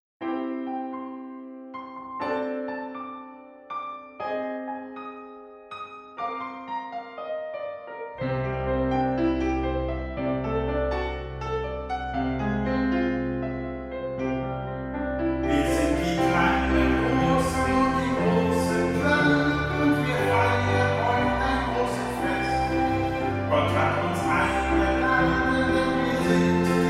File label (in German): Wir sind die kleinen Großen Gesang